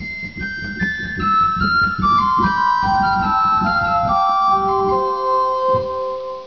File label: Flageolet; Martin; Flageolet 2